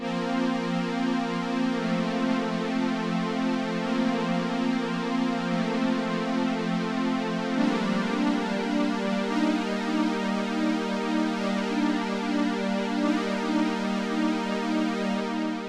pad

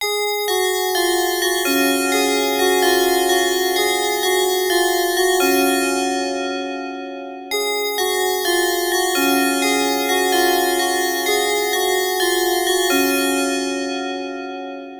Ext. Instrument; juno8

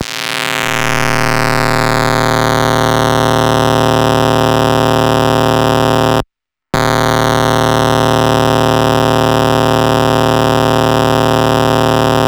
Ext. Instrument; juno6

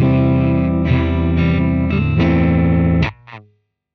GTR

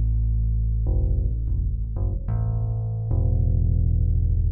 BASS

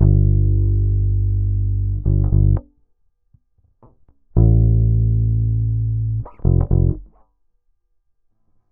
BASS

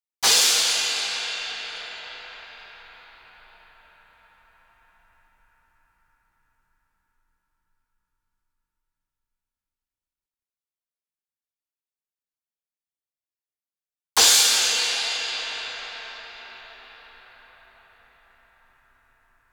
cymbals crashes

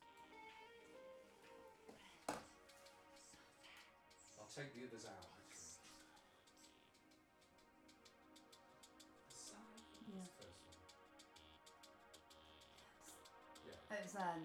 vox

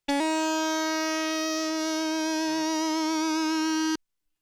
moog

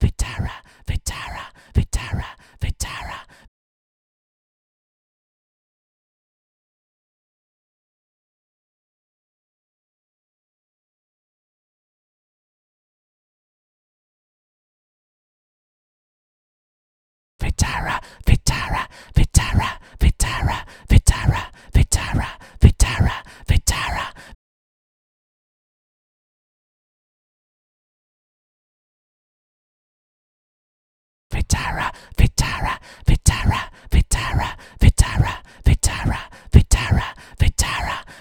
chant

